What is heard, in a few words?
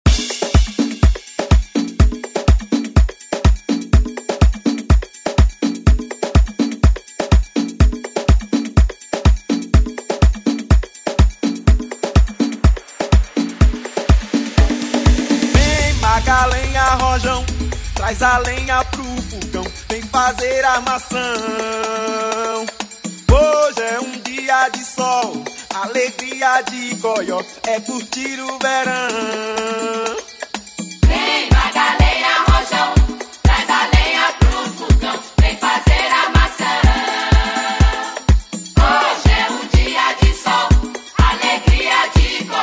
90s ReDrum; DJ Mhark; remixplanet